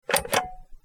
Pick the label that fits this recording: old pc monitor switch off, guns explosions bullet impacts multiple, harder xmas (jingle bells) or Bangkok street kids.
old pc monitor switch off